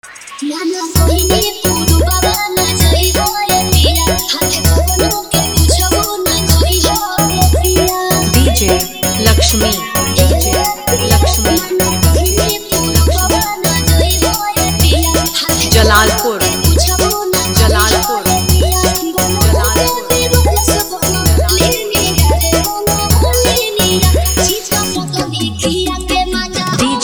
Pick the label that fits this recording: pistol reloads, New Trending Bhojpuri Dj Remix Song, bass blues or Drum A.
New Trending Bhojpuri Dj Remix Song